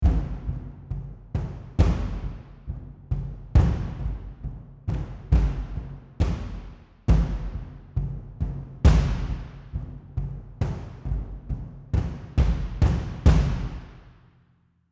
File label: Perc